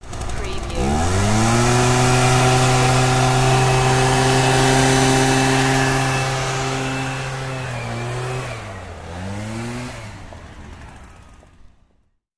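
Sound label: Industrial Weed Whacker, grass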